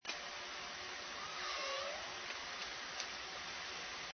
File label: transient call